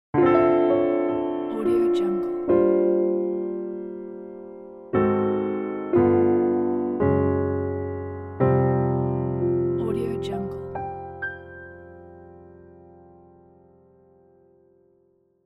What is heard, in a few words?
jazzy piano ending; classrecords